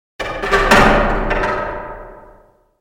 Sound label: fall